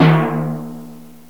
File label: Timpani D#; GMPlay; Drums; TG300B